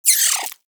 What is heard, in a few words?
Vocal Pain, Hunting Critters; Nexifera